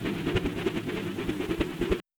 Grapple Retract; adams venture origins

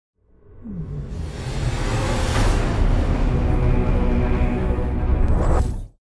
Tech Logo Short; Fable The Journey; xbox 360 gamerip; Unreal Engine

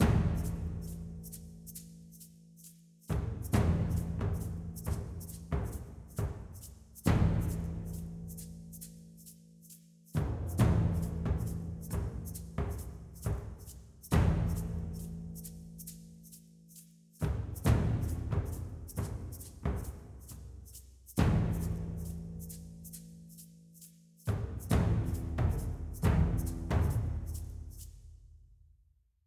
Music Battle

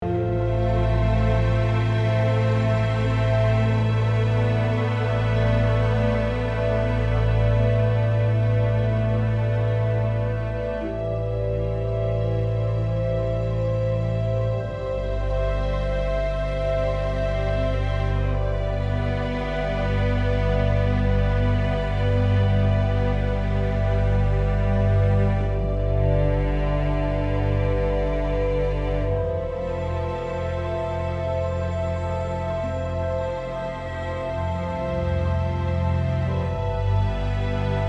Neutral loop

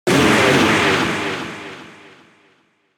mino stun

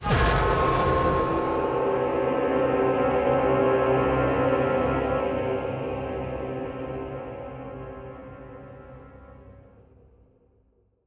Mus Multi Jingle

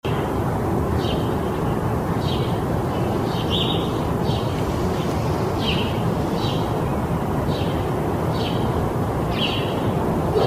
Amb Street Quiet; android ios online gamerip 2019; Double Homework

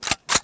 Ak47 Prime; Redmatch 2

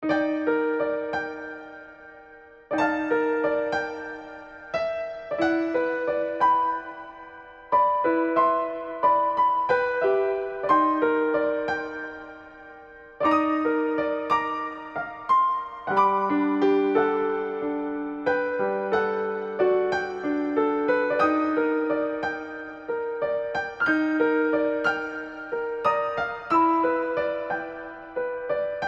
Piano; Memories Off Sorekara

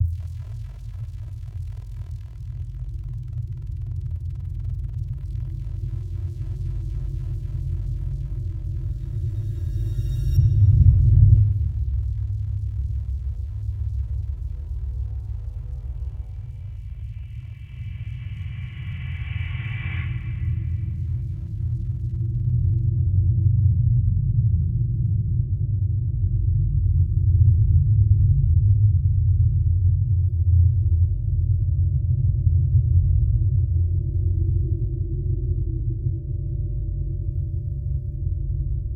Tension Suspense; Scourge Outbreak

